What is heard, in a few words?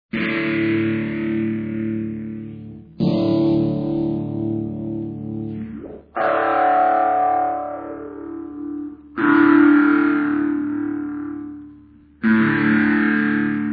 vowels; Gargletron